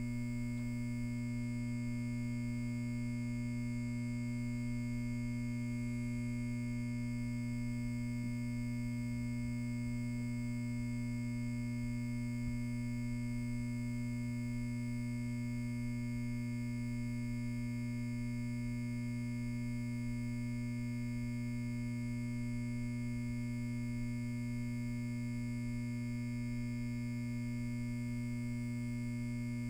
hum

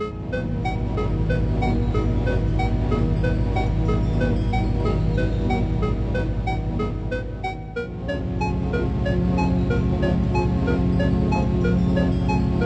maple clock

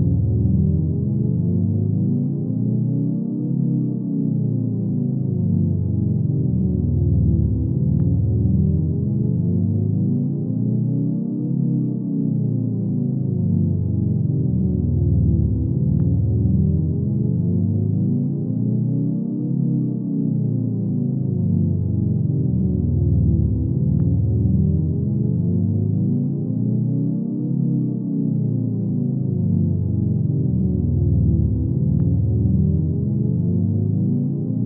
bgm slow

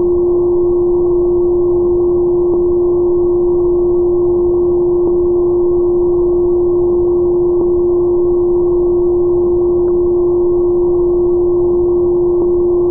tone

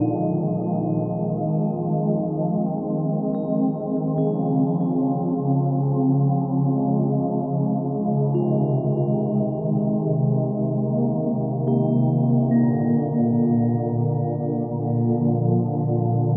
soapbubble

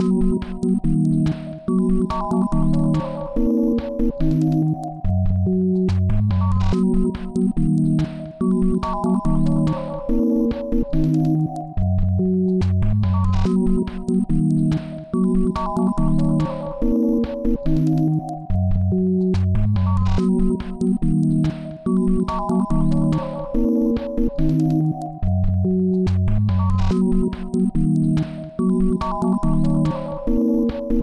Bgm; Nazca Valley

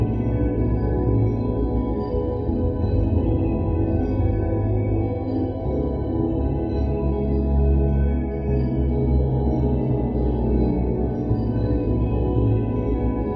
treehouse amb; maple

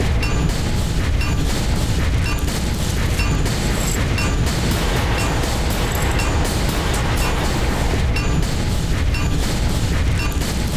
loop

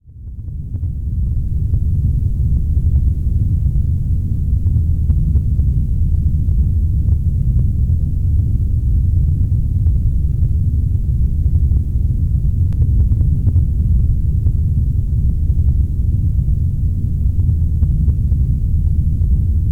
brown Noise; Yume 2kki

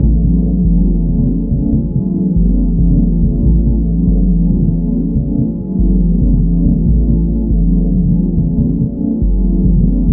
ambient; doubledream

